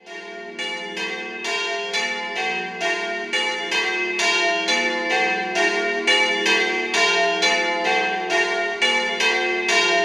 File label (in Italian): Azzanello, campane